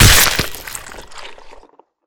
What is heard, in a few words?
mega bite; casualtiesunknown